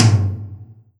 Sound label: Tom Mid H Acoustic; Colombo Acoustic